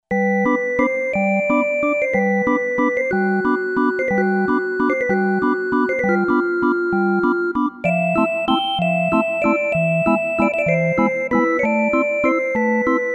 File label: Lite Organ, Roland V-Synth; Factory Patches